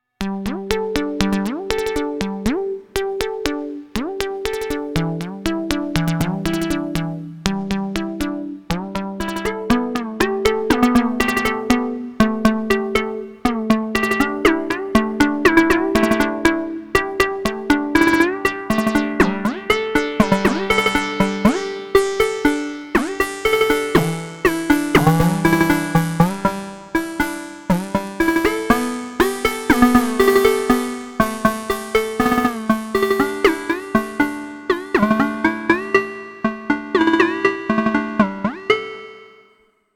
Motor Synth MkII Another Arp; Gamechanger Audio

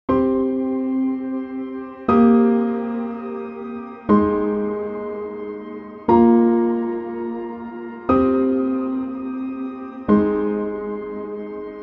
Grand; Heavyocity Ascend

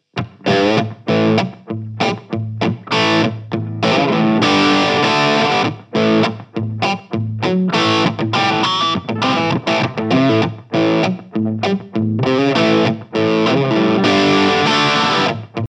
JHS Pedals Hard Drive Vintage Crunch

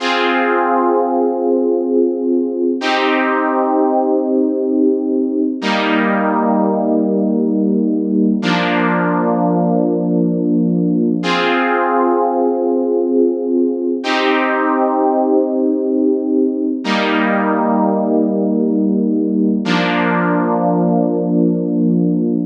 Chords

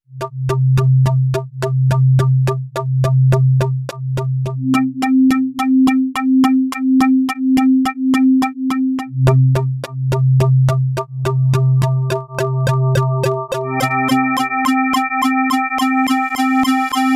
Rythmic Bottle Blow Custom LFO; GForce ImpOSCar3

